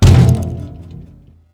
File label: glass land